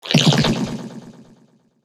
Damage

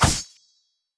Slash cross

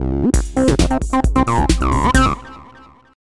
trance; Worms Armageddon

